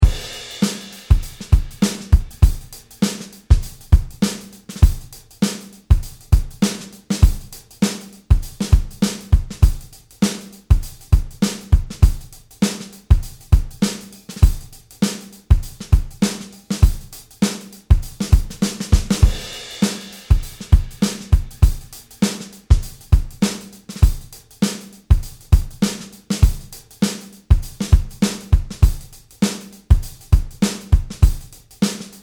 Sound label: shuffle, guitar